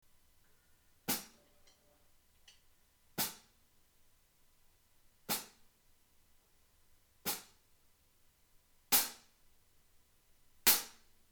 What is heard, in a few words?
Hi hat 14; Amedia; Ararat